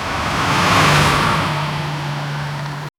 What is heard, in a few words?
sand buggy driving away; offroad